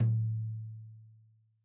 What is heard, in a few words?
Tom2 Stereo, Deeply Sampled Drums; SMDrum